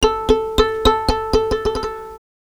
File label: Violin Extended Technique